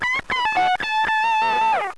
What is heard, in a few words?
GUITAR